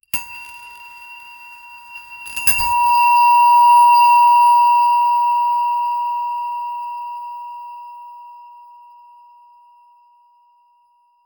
whimsical magica

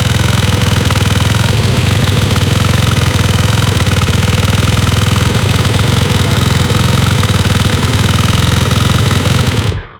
2 stroke 125, two stroke

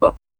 all cut to silence, silence

